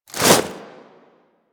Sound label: Jump Foley; Manic